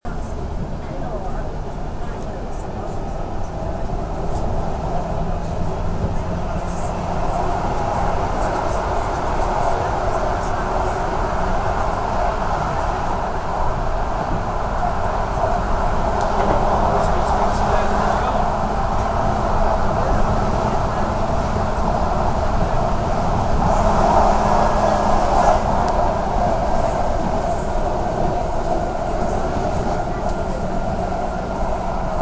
the sound of a metrolink tram approaching manchester